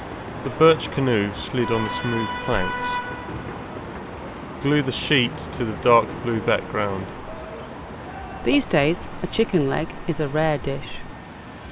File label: Busy street original conditions